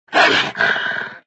Wolf Pain; Extra Lives

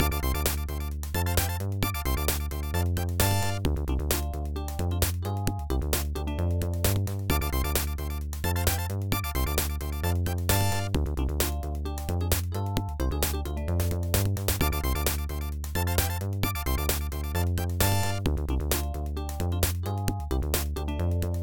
COUNTDOWN BGM; America Oudan Ultra Quiz Shijou Saidai no Tatakai; NES; DORM

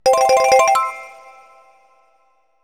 Collect Multi Banana Token; donkey kong 64

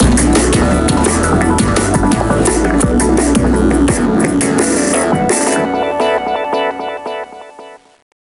Industrial Win

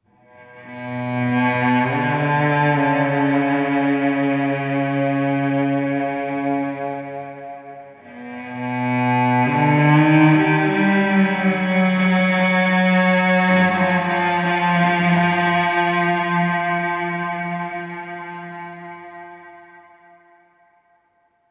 74 BPM

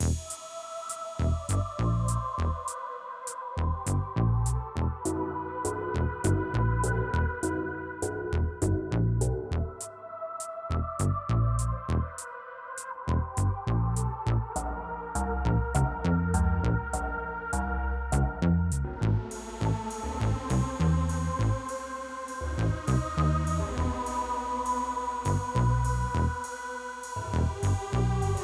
Dream Goat Run Low Part; Plants vs. Zombies Battle for Neighborville; DreadWood Quest2

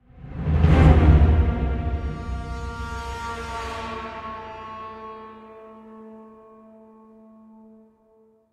String; Middle-earth Shadow of Mordor

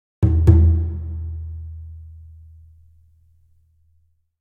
Perc

